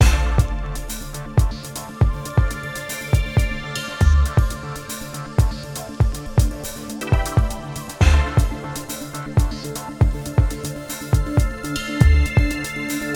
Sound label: Hard-hitting news